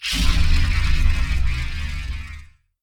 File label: die; Barotrauma; Fractalguardian